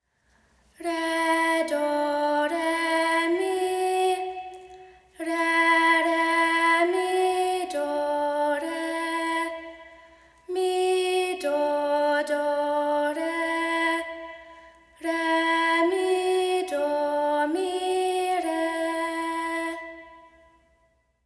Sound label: re do re mi